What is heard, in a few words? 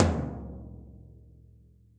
Tom Floor Warm And Low; drum hits; Ableton; Factory Packs; Session Drums Club; Standard Hits